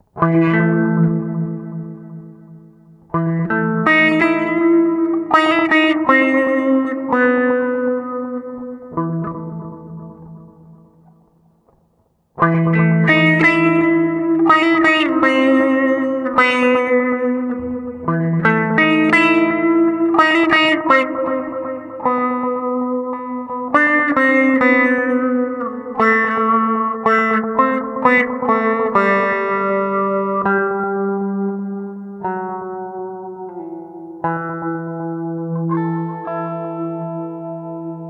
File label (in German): Moog Moogerfooger Plug Ins Gitarre